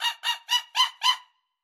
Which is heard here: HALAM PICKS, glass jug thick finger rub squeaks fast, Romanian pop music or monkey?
monkey